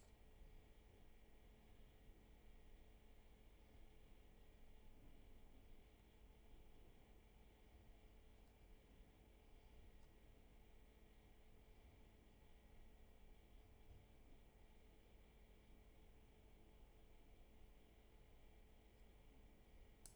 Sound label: roomtone